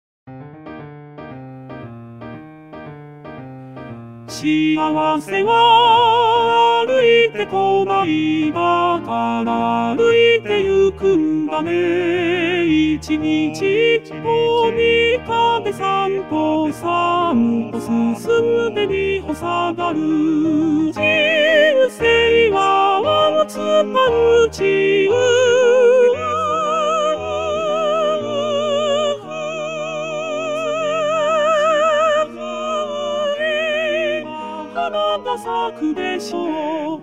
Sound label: march Sop